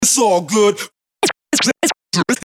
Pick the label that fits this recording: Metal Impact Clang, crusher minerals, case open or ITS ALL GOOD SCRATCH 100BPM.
ITS ALL GOOD SCRATCH 100BPM